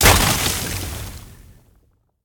uav ice

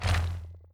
core die

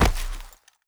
body fall down

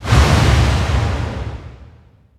dragon wakeup

